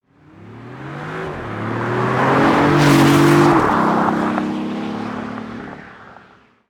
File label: BMW i8 passing by